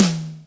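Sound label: Ambient Tom High, Acoustic Tom, Yamaha DTXpress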